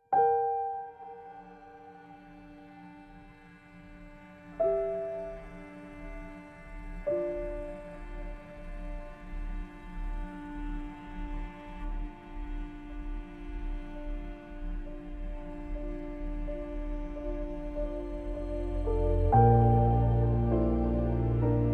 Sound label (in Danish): Reklame musik